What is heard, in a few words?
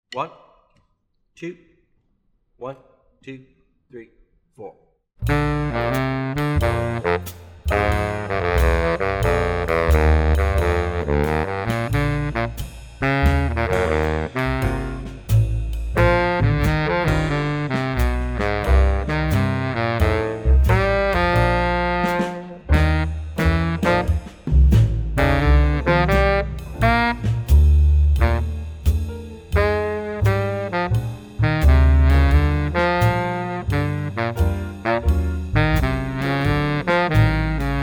swing baritone saxophone